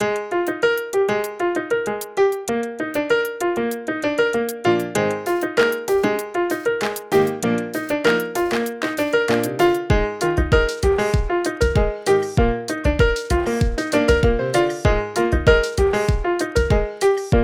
rhythmic piano, ringtones; LG X power F750K

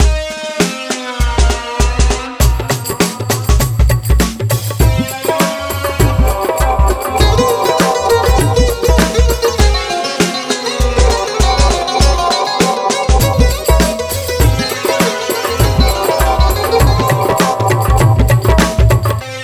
Trance; TranceBabaRS2; Sony Ericsson; PlusFourSix

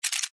camera shutter; Samsung; SGH-i620

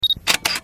camera click; Infinix Race Bolt 2

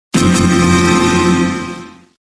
battery low, Samsung SGH-i600

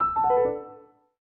Lock Acoustic; LG Wing 5G SKT

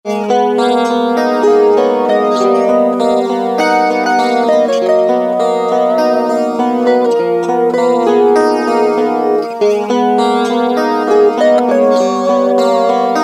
Guitar, ringtones; Okapia Air

